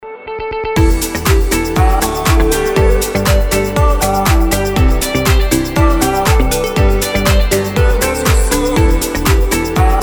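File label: ringtone light deep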